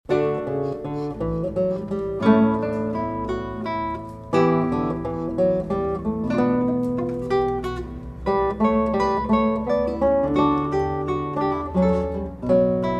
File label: Rondo (Reverb); Purcell